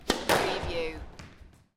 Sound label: Sports Squash Serve To Wall